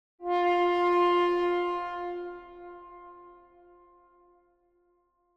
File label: Tuba Tone - Single, Higher; Hollywood Edge; sound designer's toolkit v2